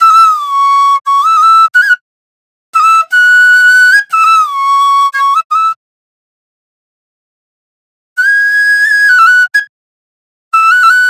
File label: Flute Loop 88 BPM B